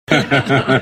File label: laugh; dhar mann